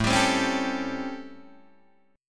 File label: A 7th Sharp 9th, Guitar, Open D